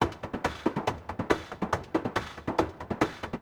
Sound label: TEKNO 140; Techno